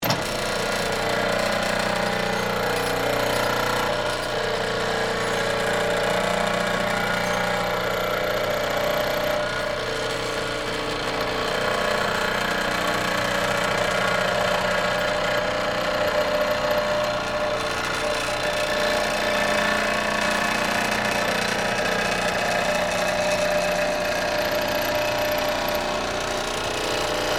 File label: Hangar Door Close, Aircraft, Hangar, Door, Close, Interior, Perspective; Transportation; Airplane; BlastWave